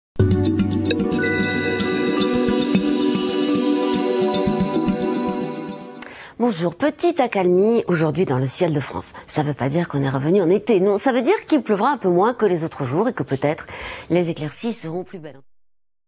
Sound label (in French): generique meteo; tf1